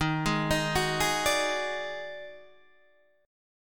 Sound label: Eb Minor Major 7th Double Flat 5th, Guitar, DADGAD